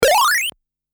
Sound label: Game, transition